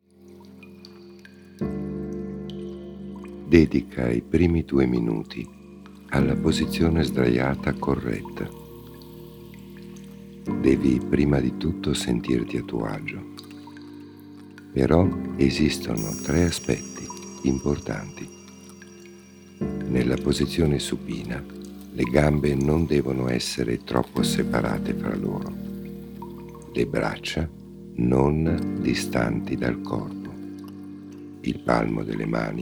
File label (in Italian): NOTTURNO MASCHILE